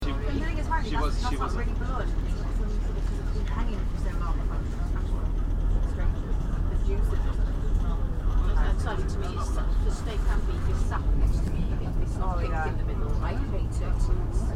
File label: train just outside birmingham